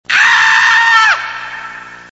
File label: Screaming man